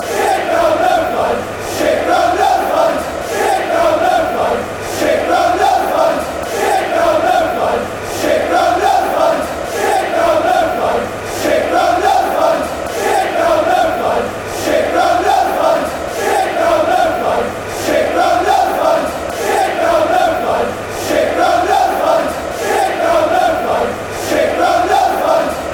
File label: chants; sit ground no fans scfc